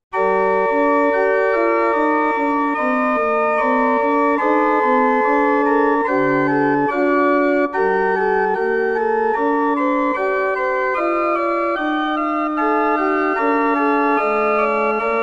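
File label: Prelude G minor; J.S. Bach BWV929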